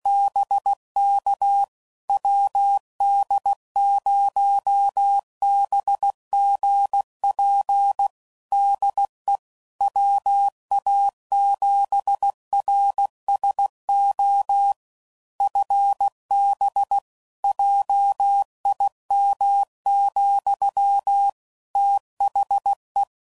Spaced, CW Code Groups 15WPM, QSOs; HamRadio; Morse Code; WD0BGP

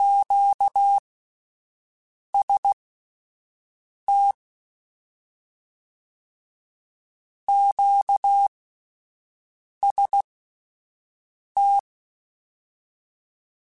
QST QST, Morse Code; Mixed; HamRadio

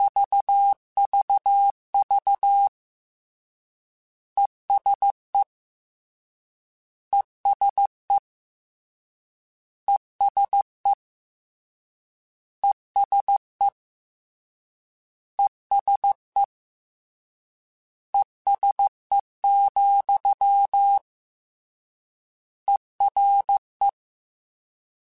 CW Code Groups Suffixes, ESE ERE

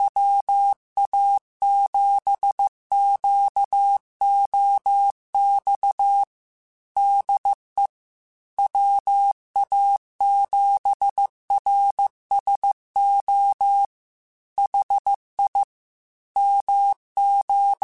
Morse Code, QSOs

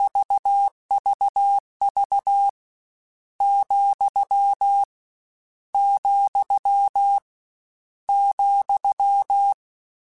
Morse Code, CW Code Groups; Mixed

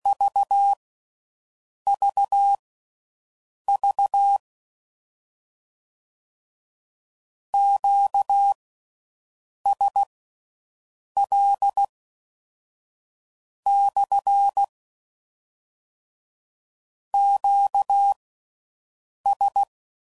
Q Calls, CW Code Groups 10WPM; HamRadio; Morse Code

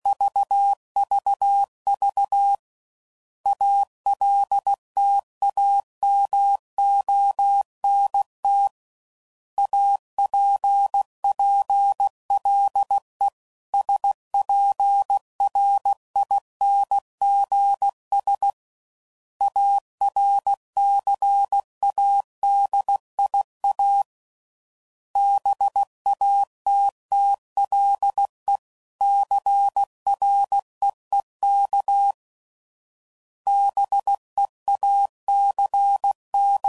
Cities, CW Code Groups 15WPM; Morse Code; HamRadio

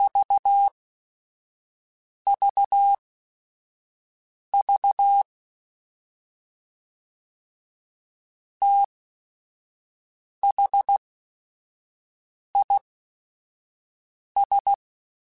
T then H Runs, Morse Code; HamRadio; Prefixes

